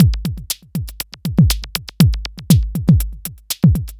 ZERO GRAVITY GROOVE; FRENETIC FRACTAL GROOVES

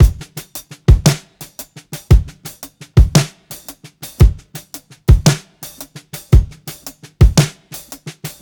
114 SHUFFLE, DRUMS LOOPS; BASEMENT DRUMS VOL 2

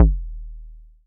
kicks, drum machines, FutureRetro FR777

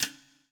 POWER RIM

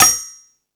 Rap Session, Drum Machines E-MU Pro-Cussion

